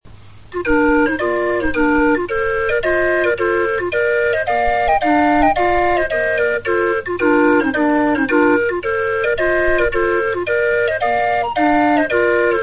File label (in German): floetenuhr